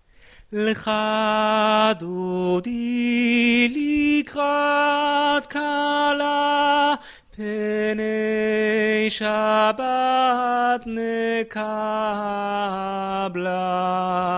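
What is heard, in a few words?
tenor, choir music; BCC choir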